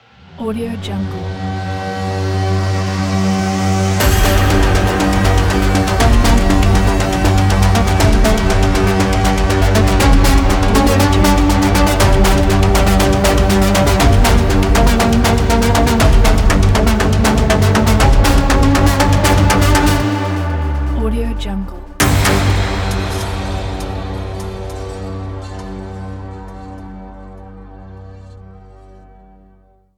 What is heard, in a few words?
dangerous situation intro ident